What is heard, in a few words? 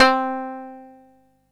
koto C3; East West; Global Sounds